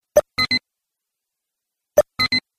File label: e-mail tone; ringtones; sms